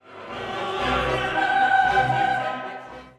Gloria Falling chord

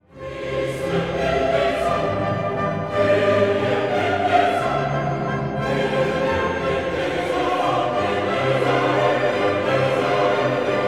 Kyrie Merry Chorus

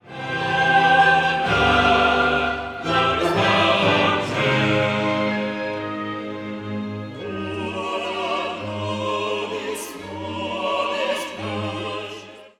Agnus Dei Dona Chord

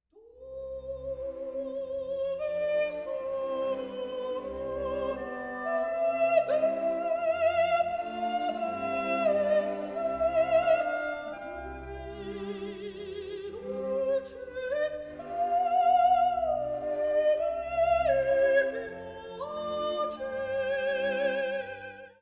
Dove sono Sweet

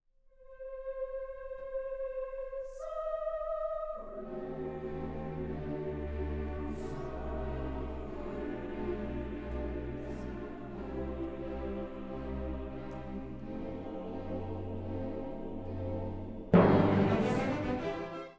Timpani bang; KV262 Gloria

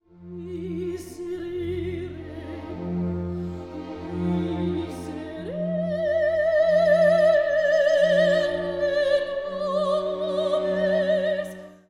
Agnus Dei Miserere

